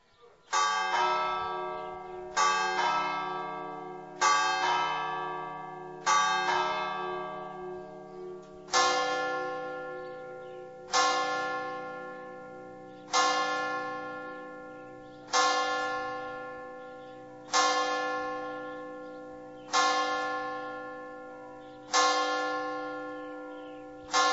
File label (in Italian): dornberk, campane